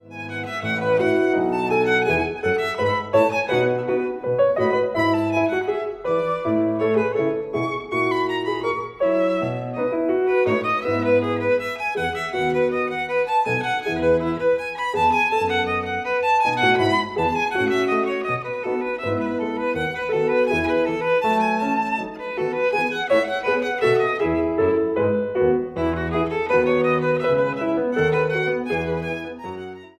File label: VIOLIN